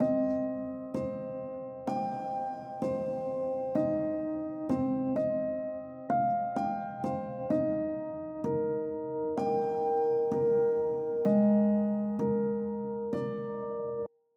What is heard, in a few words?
REVERB RAUM ON PIANO ONLY